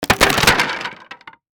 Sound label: wood smash